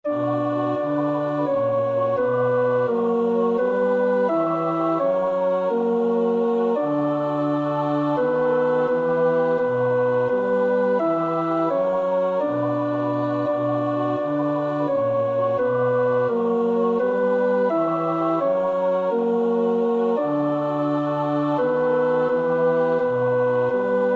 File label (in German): Ich falle dir, mein Gott, tenor; Wolf (Ernst Wilhelm); Jesu deime Passion; 08.Ich falle dir, mein Gott